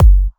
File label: Kicks; Vengeance Samples